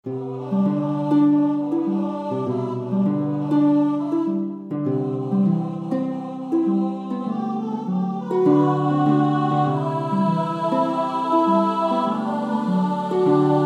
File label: Alto